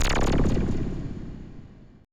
AMBI FX

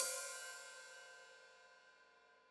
Hat Open, Hi-Hat; Deeply Sampled Drums

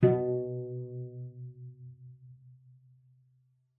cello pizz B2; 90 s Sample CDs; Xsample VOL-5

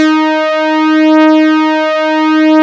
LEAD, Nord; Wizoo - Powered Nord; LEAD PAD HRN

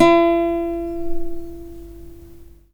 LUAN E4; Spectrasonics; Heart of Asia

